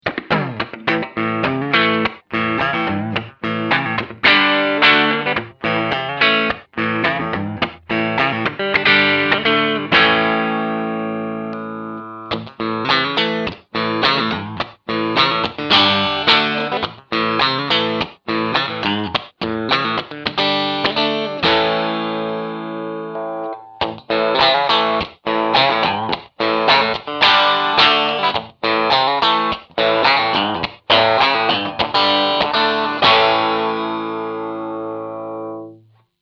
Coil Split Clean 3 Positions

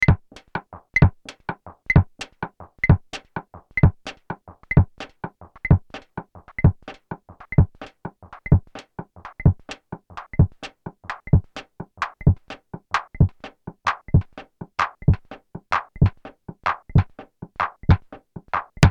Roland TR 626 Saturation Filters

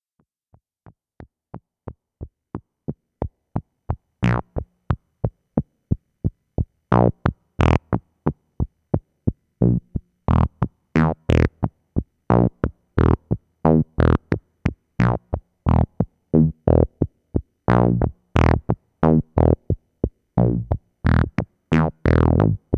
vc ADSR by Sequ

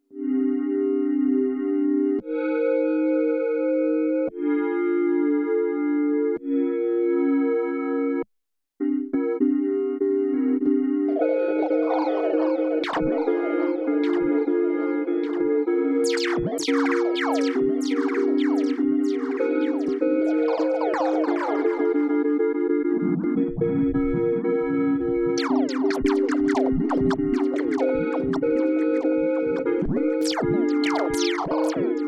Synthesizer; Boss DD8

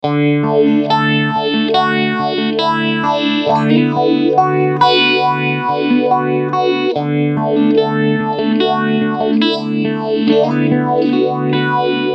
phaser

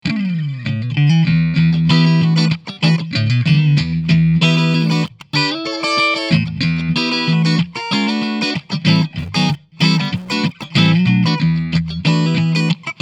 Cleansound

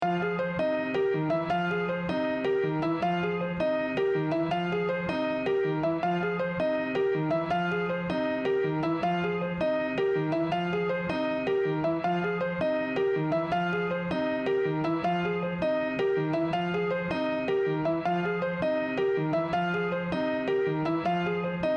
Piano Wet

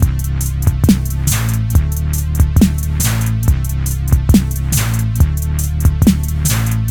Roland SBX 1 Shuffle 50

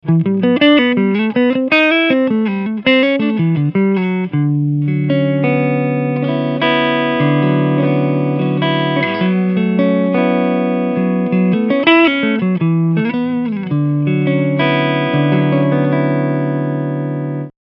Preset Jazz Poweramp

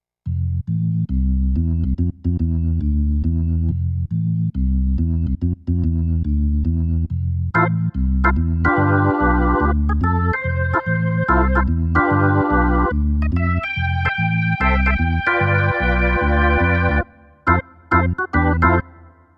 Yamaha P 515 Jazz Organ

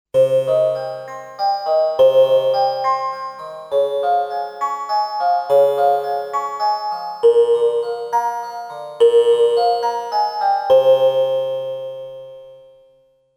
Kalimba Resonator; Reason 10; Klang

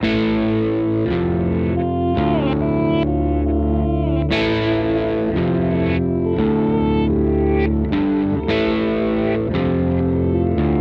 Reverse Delay; Boss DD8

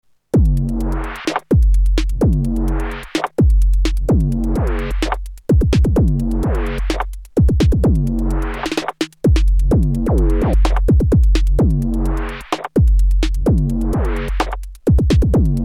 Pattern Chaining; Erica Synths Perkons HD-01

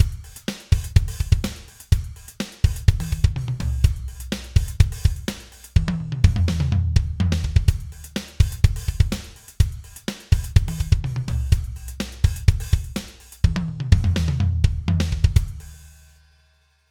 Drums Custom Set; Steven Slate Drums 5

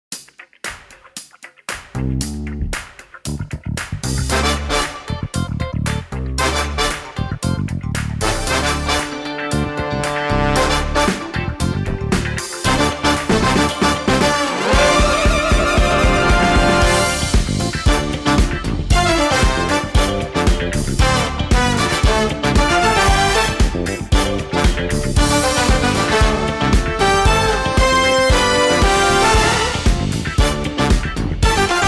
Hollywood Funk

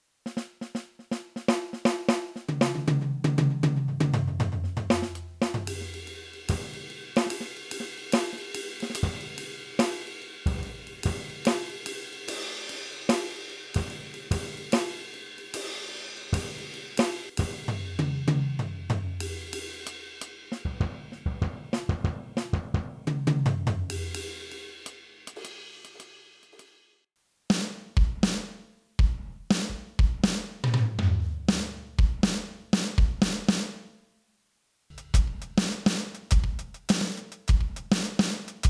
ROLAND SPD SX Pro Acoustic Drums

